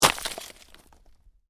Ice impact medium, Ice: medium impact with debris; Snow; Weather; Stuart Duffield

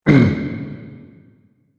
Martial Arts Yell "Uuhmnh"; Combat Voice Sound Effects

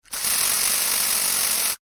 household aerosol can spray; Objects; McKinney Sound

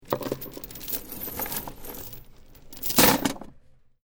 Heavy Chain Rattling Drop Down; Metal Sound Effects; LYN104 package of Chain sounds; Lynne Music

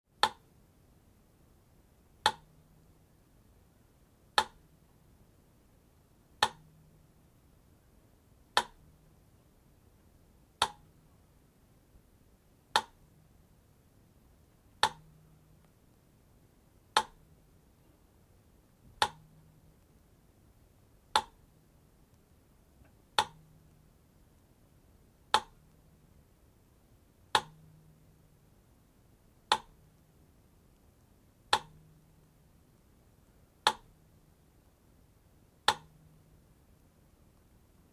Metronome Tick 4 Speeds, Mechanical metronome; Clocks; Machines

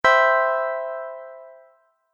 Magic Chime Button; Button Sound Effects

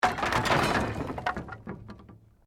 Wood Collapse

